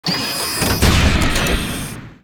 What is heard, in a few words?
SIEGE ATTACK; ACCRETIA